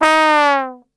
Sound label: D#2 TRB, Brass Super Section; Best Service; 90 s Sample CDs